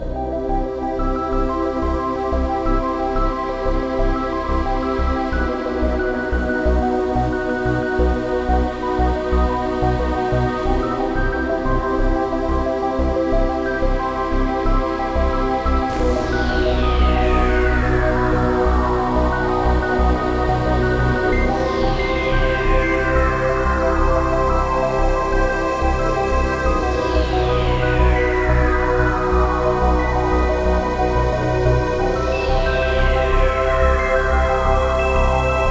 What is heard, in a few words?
High Altitude Station, fantasy